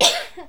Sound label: NOISE, CLASSIC VOX; Best Service; Hallelujah